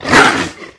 HIT; Eft; Greatwall Soldier

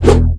FIRE GOBLIN attack, game; Eft

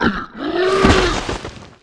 toad attack

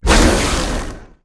king crystal attack; Eft

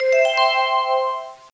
Skill Level Up

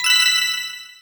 artifact effect sound

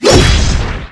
Sword; Eft; Weapon

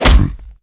HIT; Eft; Emperor Soldier

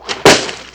Bullet; Eft; Fairy Range

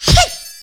Attack; Eft; Fairy Melee

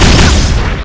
Weapon Spear Hit

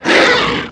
Cow Hit

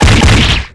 Weapon Sword Hit

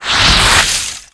Yeti attack, game; Eft

